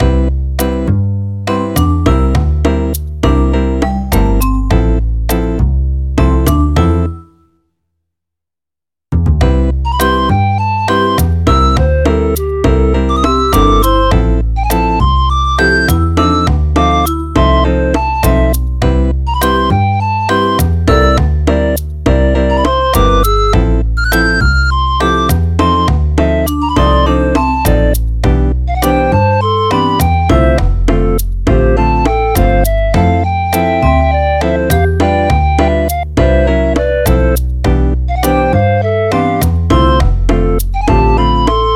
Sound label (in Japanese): piyopiyo bossa